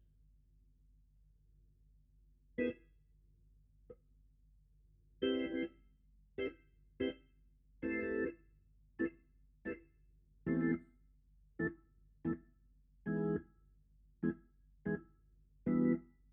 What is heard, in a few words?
Hammond